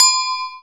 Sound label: Agogo Low Std; GMPercussion; 3DO; Toolkit 1.5; GMPercussion44k